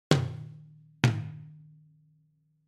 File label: drumtom